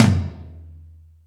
Toms, Drum Machines E-MU Pro-Cussion

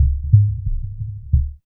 HEARTBEAT; East West - Phat & Phunky

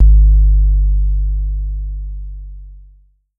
Pollard Syndrum Sub

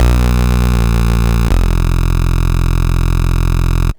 Sh09 Bass Loop, 120BPM

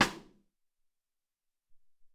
Rim Shot, Alesis SR-18

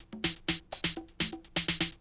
Classic DrumLoops, 125

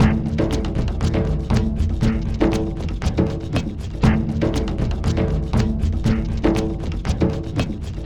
BIG BEAT ACOUSTIC MELT; Sonic Foundry (Sony Creative Software); Vir Unis Infusion

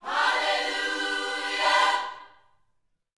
Vocal, Gospel, PHRASE; Spectrasonics

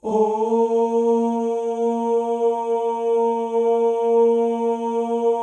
male ohs; Spectrasonics; Symphony of Voices

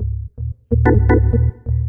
lop 126bpm, House Instr and Stab Loops

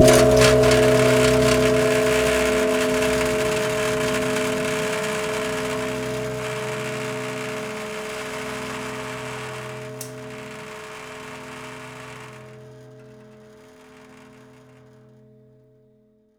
Prepared Drums

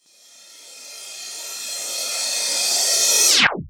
Reverse Cym, Cymbals

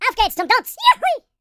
german, YODEL YELL; ILIO; Vocal Planet

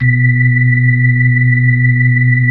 B-3 Hollow; Keyboards of The 60's and 70's

